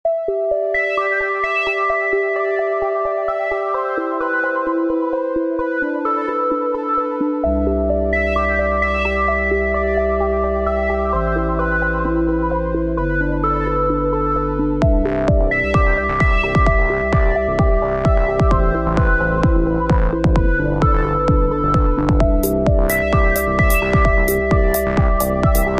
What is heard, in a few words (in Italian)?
DOLCE